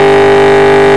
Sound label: E36 High, Engine; RealisticCarControllerV3